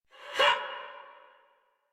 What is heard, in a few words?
Attack Effort; Warframe; Dax; Ranged